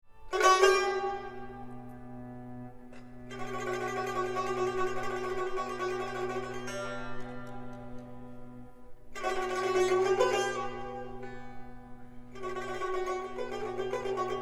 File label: tar